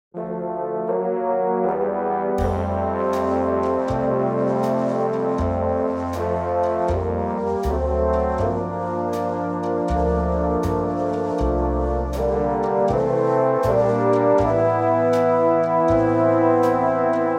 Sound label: F orchestral; key - F - vocal range - A to E